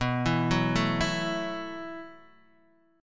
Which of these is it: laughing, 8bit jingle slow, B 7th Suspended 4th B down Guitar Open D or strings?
B 7th Suspended 4th B down Guitar Open D